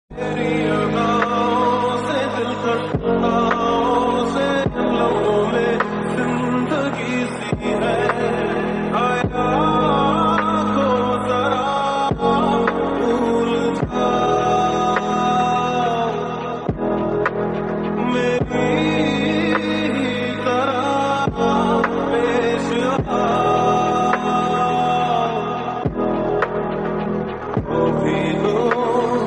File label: love ringtone hindi sad ringtone bgm ringtone